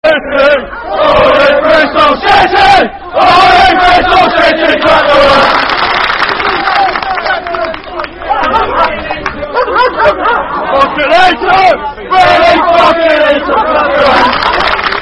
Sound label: Football Chant (Soccer Songs); Bristol Rovers; fanchants-com